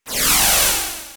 enemy diamond saw; SNES; SMRPG

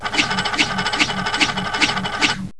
Ratchet Noise; PC; MARIOTEACHESTYPING2

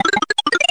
beanstalk Growing; N64; YOSHISISLAND

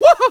whohoa; DS; ML BOWSERSINSIDE; Mario

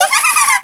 laugh; DS; ML BOWSERS INSIDE; Fawful

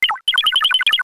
enemy small attack; SNES; SMRPG

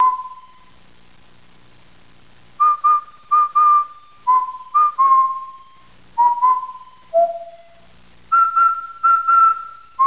Whistling No background noise!; GAMECUBE; LUIGISMANSION

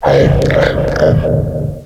eating, Echo (inside caves, underground ect.); DS; ML BOWSERS INSIDE

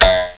Cartoon Sound; PC; MARIOSFUNDAMENTALS

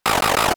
battle chomp; SNES; SMRPG

